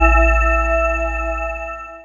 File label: SWIRL; X-Static Goldmine 1